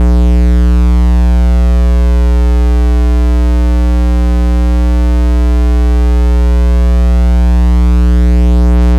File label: 60hz hum, ambience